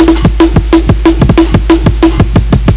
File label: Beat